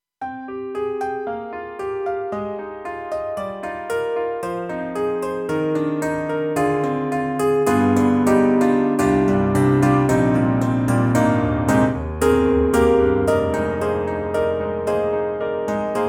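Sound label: Metallic Grand, piano; KORG M3 XPanded Program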